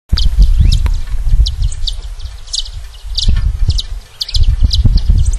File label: Sicalis lutea; Hornaditas